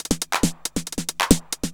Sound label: percussion loops, Techno; PS-45